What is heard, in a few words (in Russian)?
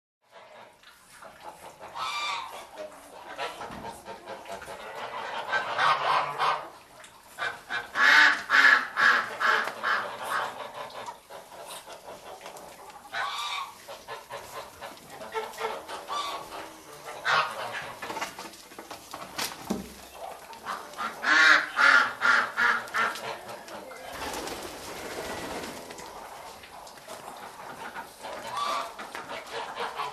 zvuki gusej utok kur